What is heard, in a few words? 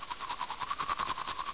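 brush teeth; Image Bonanza; Expert Software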